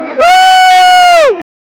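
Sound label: construction workers scr, chaos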